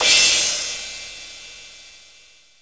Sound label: cymbal